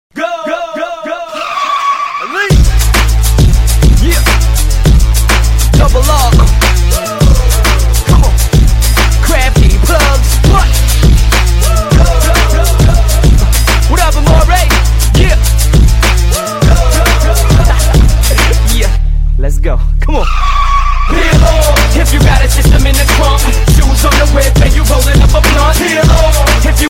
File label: bass ringtone; 2 fast 2furious